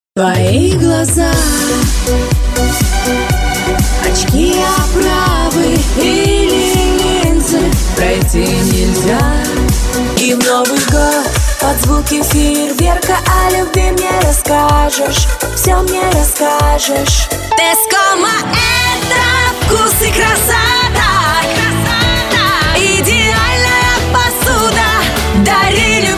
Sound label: vokal; dictor